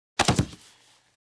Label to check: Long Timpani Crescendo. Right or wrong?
Wrong